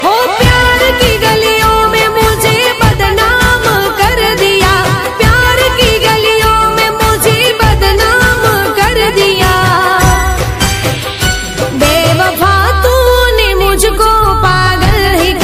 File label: Tik Tok Famous Song Ringtone